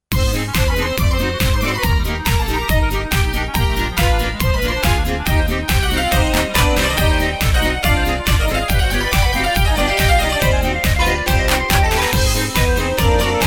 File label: cimbal